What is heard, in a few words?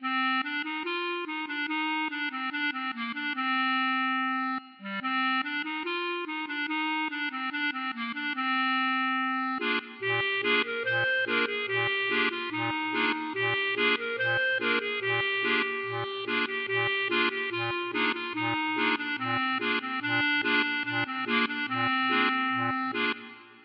klezmer